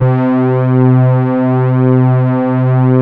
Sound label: ODY PAD, ARP Odyssey